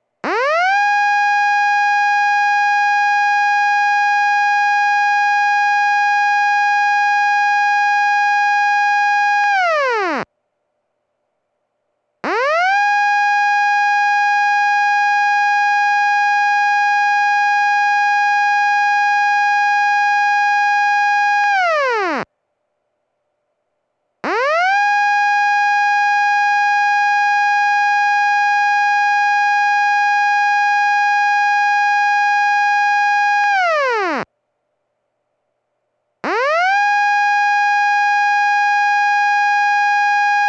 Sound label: siren